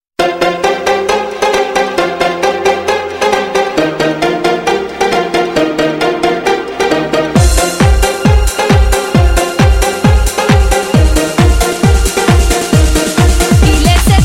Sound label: Cool Tone